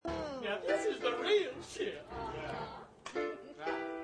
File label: rap song; scary movie 2